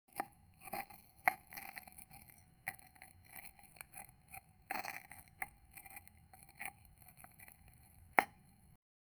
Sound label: an asmr sound of a, cake